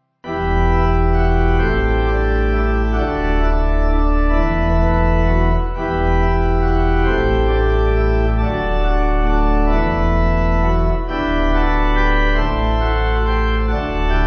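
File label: Pipe Organ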